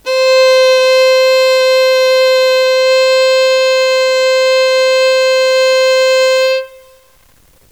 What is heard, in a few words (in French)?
violon do